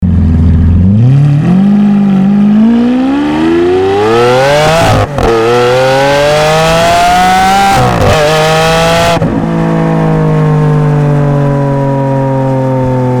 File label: Aventador Acceleration; GTR